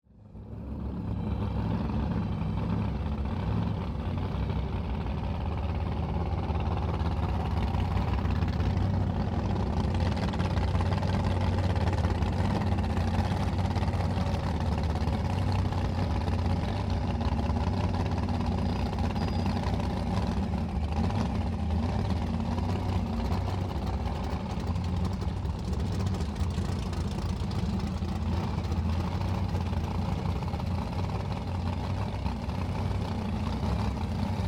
Dragster Approach, Sports, Dragster, Approach, Idle, Revs, Drag, Away, Two, Cars; Race Car